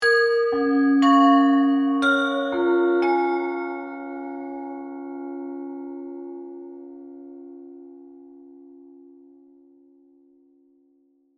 Musical Chinese, Production, Element, Musical, Chinese, Oriental, Bells, Accent, Mysterious; Musical Sound Design Sound Effects; BlastWave